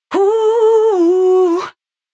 F-m 78 adlibs

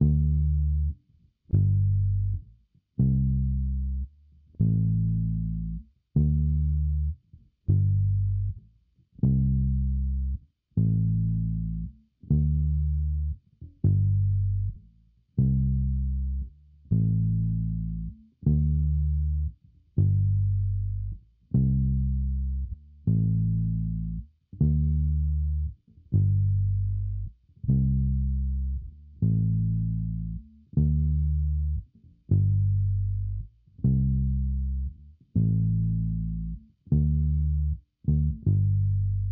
Bass

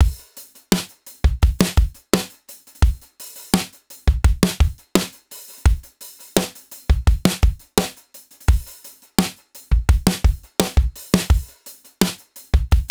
Christmas Melodies 85bpm drum loops 85

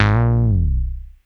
synth bass note slide moogy bend A

